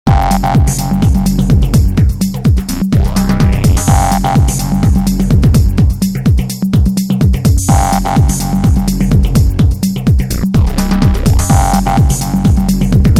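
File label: Progressive House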